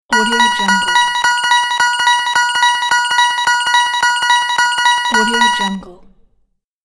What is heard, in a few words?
videogame winning score